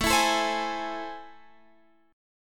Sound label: A Minor Major 7th, down